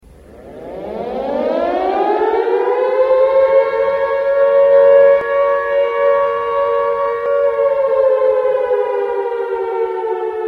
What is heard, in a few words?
air raid